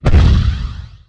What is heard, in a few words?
Enemy Fire; Defiance